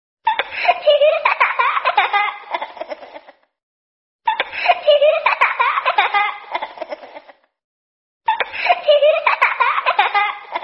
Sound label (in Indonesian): nada dering Nokia cackle, ringtone dari ponsel Nokia klasik yang menampilkan tawa; tawa anak-anak yang menggemaskan